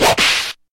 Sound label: Bass